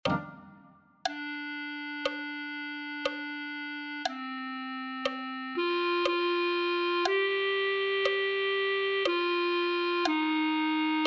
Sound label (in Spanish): me da fuerza a mi clarinete